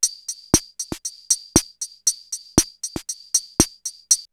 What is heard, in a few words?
CR78 BeatBox, drumloops, DrumMachines